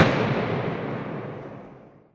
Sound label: crash; Crash Bang Boom